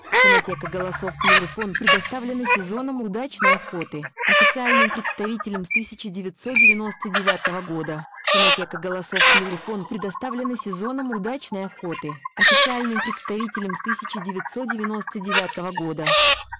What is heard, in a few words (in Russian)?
Чирок-свистунок